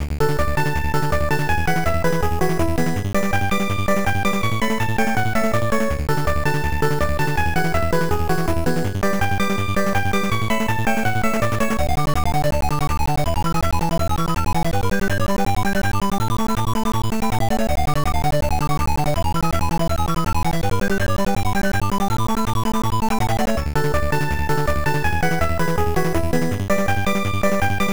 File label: Roulette; bomberman misobon roulette arcade gamerip 1996